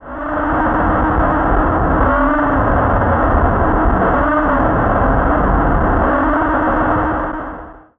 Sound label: Static; Blastwave